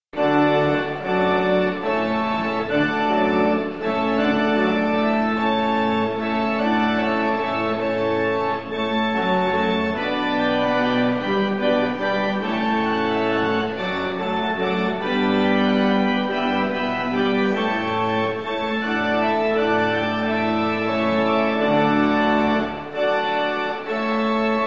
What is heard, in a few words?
Hornpipe, organists